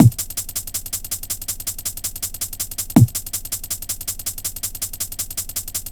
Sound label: Bass Drum, Loops, breaks; m8 breaks